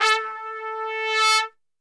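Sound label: A 2 trumpet; Best Service; Brass Super Section; TRP SWL; 90 s Sample CDs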